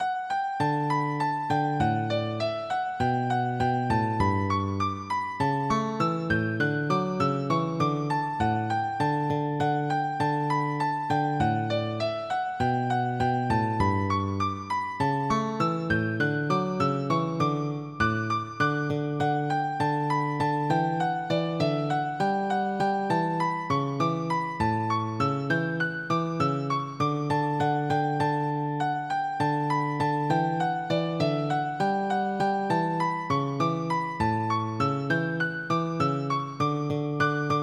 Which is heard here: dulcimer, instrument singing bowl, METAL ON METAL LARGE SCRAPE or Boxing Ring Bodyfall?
dulcimer